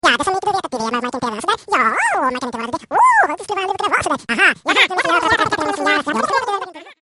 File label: Comedy Vocal